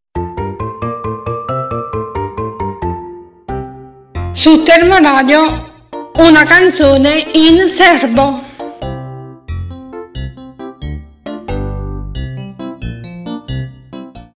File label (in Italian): Canzone in serbo